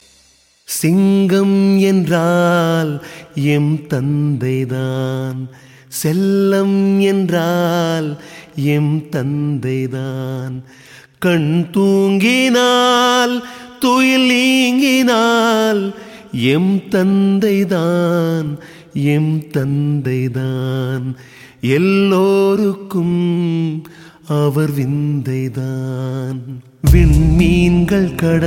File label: Sad Song; Asal